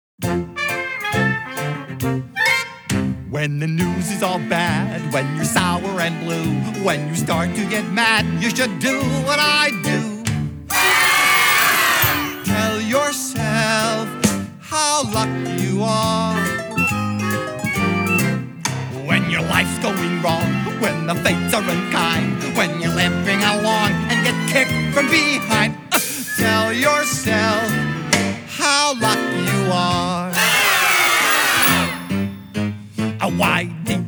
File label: vocal guide